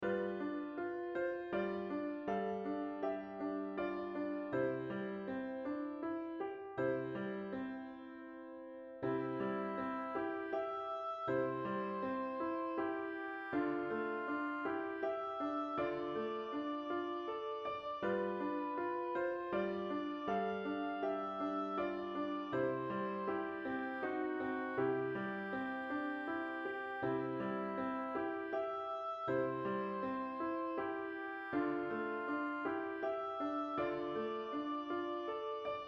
Lullabye

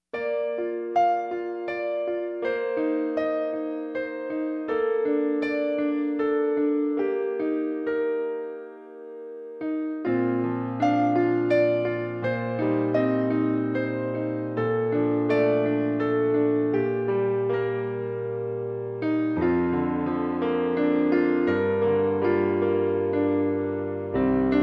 piano solo; shepherds cradle song

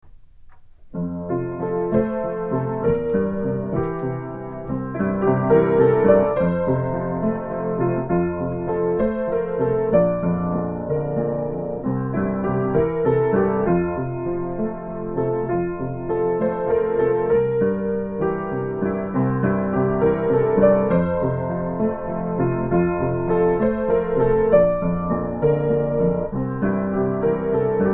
piano; Clasped in the Arms of Jesus

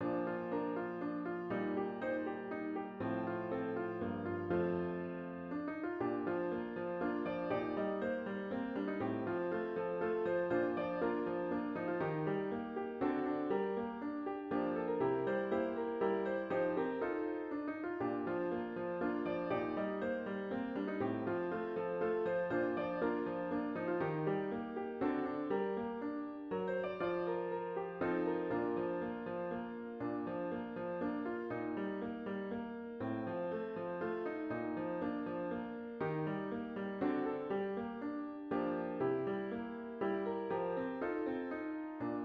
piano

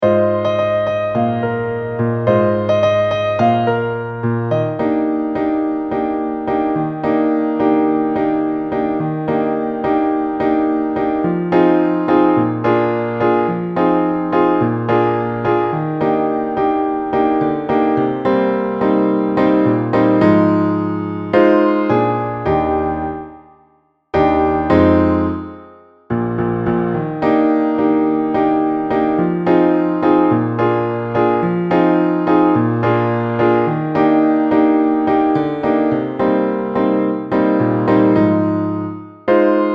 Piano

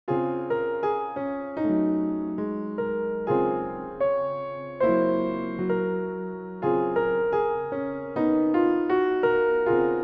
piano

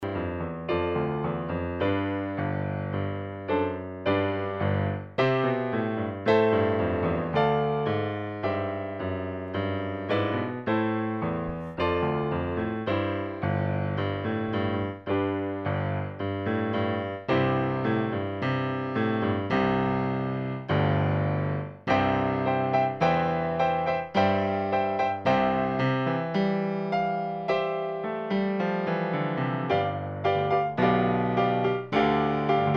Piano; My Country Tis Of Thee

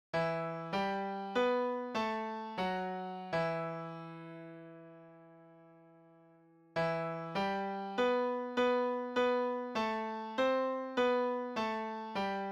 Piano Solo; O Come O Come Emmanuel

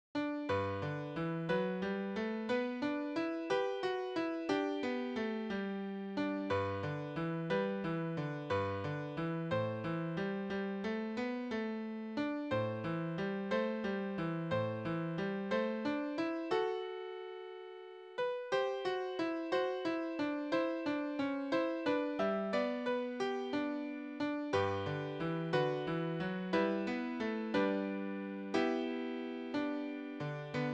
easy piano; The Lord is My Shepherd

